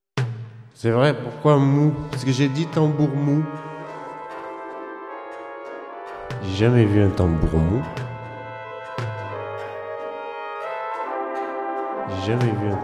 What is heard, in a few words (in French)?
Tambour mou (avec la voix; RTLM; Christophe Tarkos